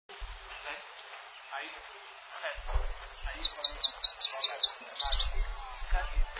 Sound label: Sicalis flaveola; PN El Palmar